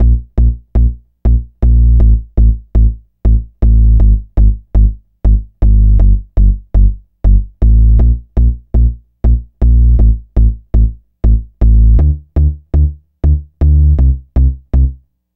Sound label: Deep Bass; Dr. Fink's Funk Factory